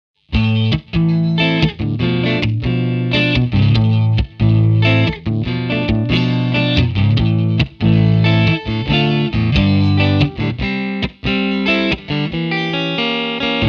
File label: clean; ampvergleich